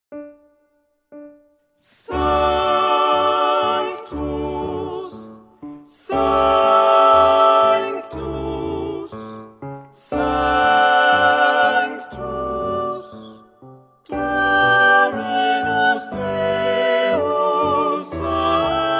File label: Sanctus alla